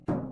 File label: Metal Box Impact